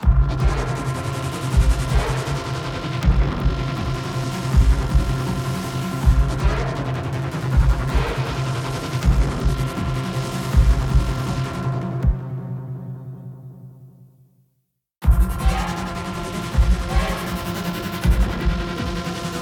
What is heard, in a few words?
BGM combat mob enemy, Player dying; Babylon's Fall